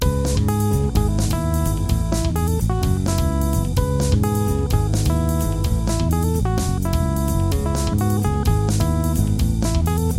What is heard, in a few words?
main riff